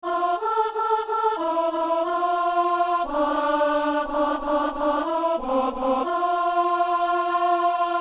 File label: alto; Messe simple - P.U.